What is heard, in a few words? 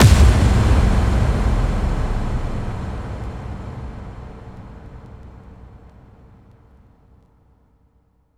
Bassdrums FX, FX Kicks; Vengeance Samples